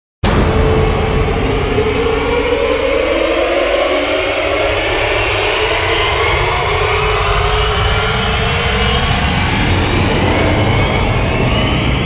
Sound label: Orchestral Riser; Epic Tools vol. 1